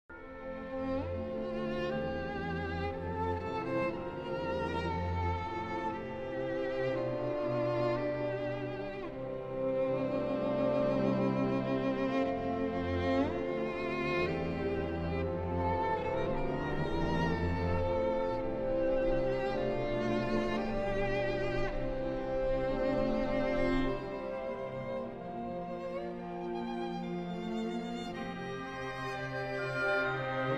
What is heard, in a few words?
Violin Concerto in A Minor, Adagio ma non troppo